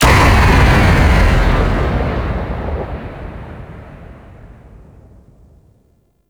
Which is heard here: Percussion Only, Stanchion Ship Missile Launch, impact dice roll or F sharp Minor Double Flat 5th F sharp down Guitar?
Stanchion Ship Missile Launch